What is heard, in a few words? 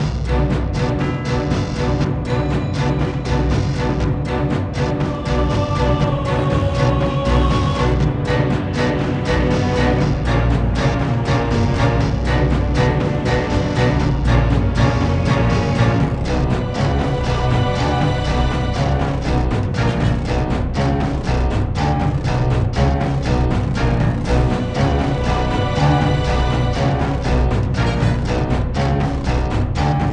Action; Warrior Kings Battles